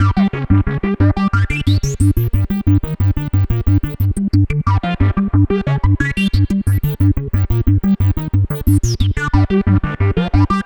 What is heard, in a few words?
Pure Analog, Keyboards; Sonic Foundry (Sony Creative Software); Leo Cavallo Bass Taster